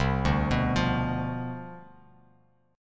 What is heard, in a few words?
C Major 7th Suspended 2nd Suspended 4th C down, Bass Standard, chord; CM7sus2sus4 arpeggiated; JGuitar